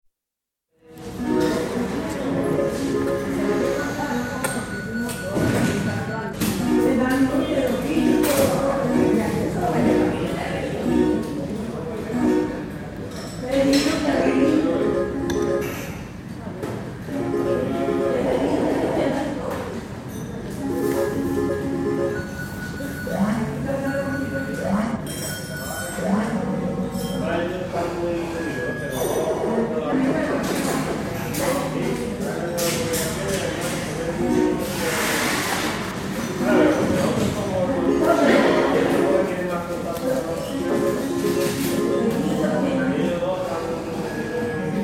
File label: Spain: Noisy bar, with loud fruit machine & general chatter; Restaurant Sound Effects; BBC